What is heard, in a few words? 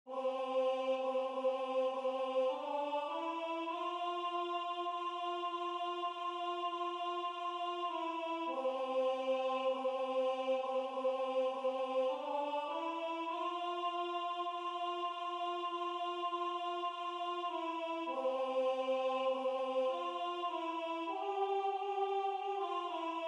Alto